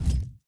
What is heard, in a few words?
Hand Paddle Attack Med